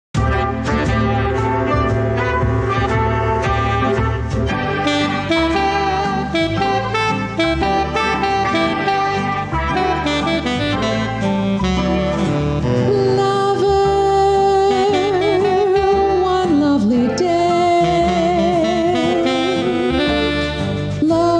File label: vocals